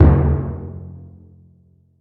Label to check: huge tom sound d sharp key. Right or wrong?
Right